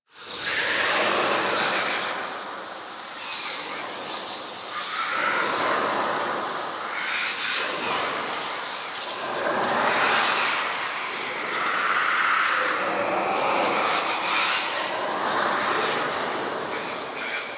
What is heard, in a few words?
Tendril Wind; Archon Death; Warframe; The New War